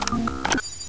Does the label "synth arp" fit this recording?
No